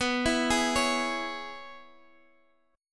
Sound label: C sharp Minor 7th, chord